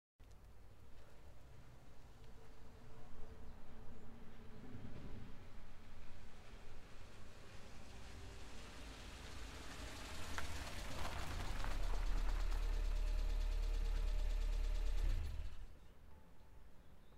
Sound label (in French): BD sonore